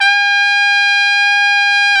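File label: brass, Tpt f; Roland L-CD702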